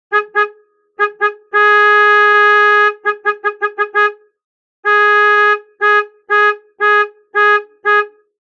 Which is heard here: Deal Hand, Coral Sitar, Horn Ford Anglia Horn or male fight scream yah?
Horn Ford Anglia Horn